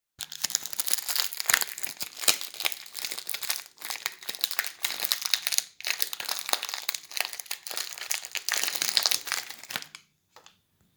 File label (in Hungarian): papír